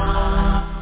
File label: Aciid; boomboxdemo